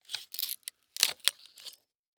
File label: Weapon Sword Pull From Wood; AdobeCC